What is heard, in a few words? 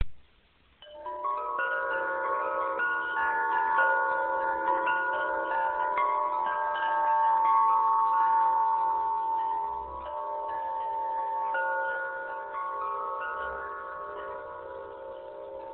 Chimes; Bleeding Heart